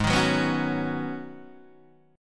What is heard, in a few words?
G sharp Minor 11th G sharp Guitar DADGAD, chord